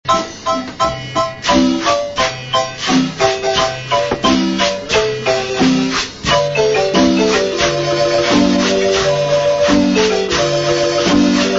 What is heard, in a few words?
marimba